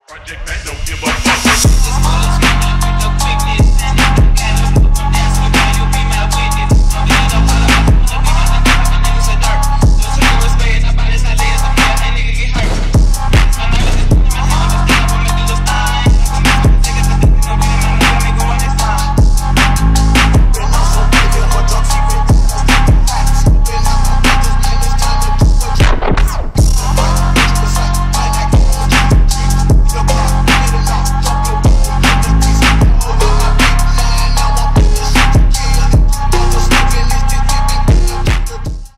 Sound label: slowed reverb